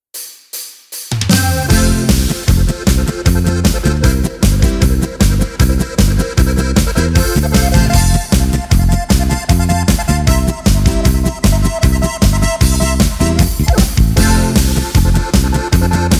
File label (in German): Polka ohne Chor mit Begleitung